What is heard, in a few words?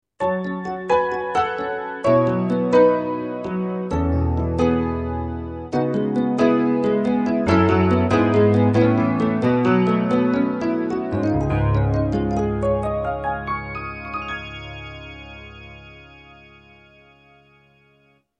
Piano